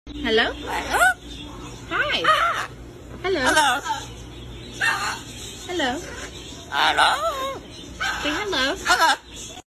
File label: Macaw